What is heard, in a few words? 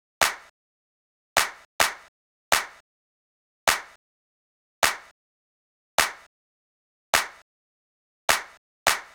Clap, 104, Cmin, Drum Tracks; Hip Hop Exotica; Big Fish Audio